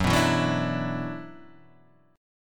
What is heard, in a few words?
F sharp 7th Suspended 4th Sharp 5th, guitar